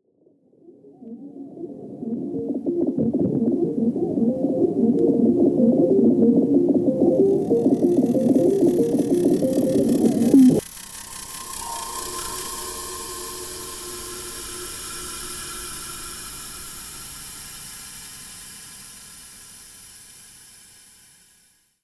Future jingle

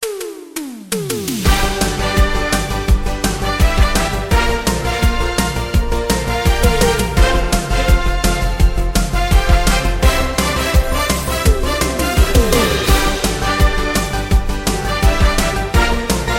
game show theme

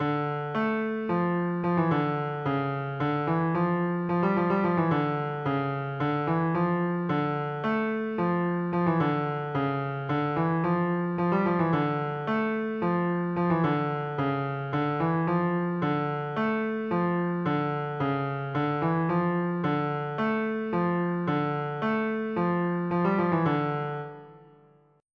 Fugue

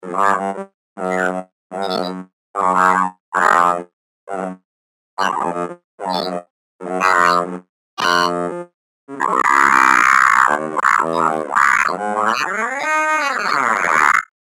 speech to midi counting

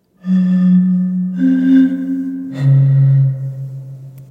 Wine flute audio

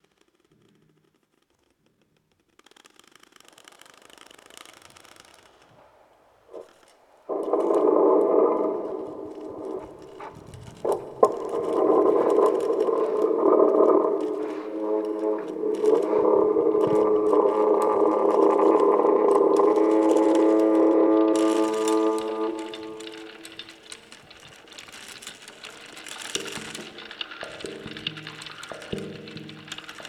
Field recording

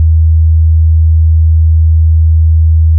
Sine2

